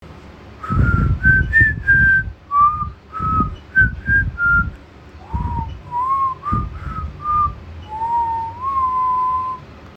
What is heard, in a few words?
whistle